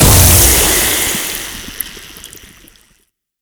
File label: Infested Ancient Toxic Ground Blast